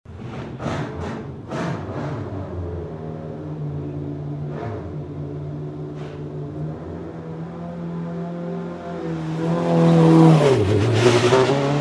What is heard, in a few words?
SFX Bed Motorsport